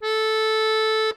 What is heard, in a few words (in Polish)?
akordeon 44khz